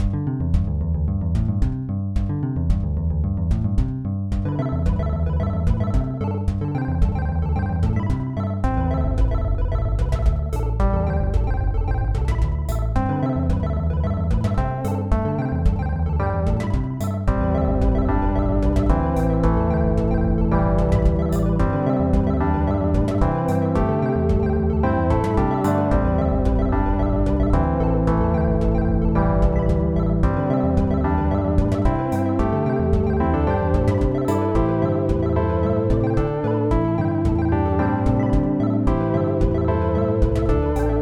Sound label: chiptune; lexys-labyrinth; soundtrack